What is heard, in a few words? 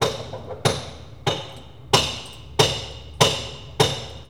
Hammering, INDUSTRIAL